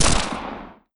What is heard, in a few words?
mp5 distant; hl1 weapon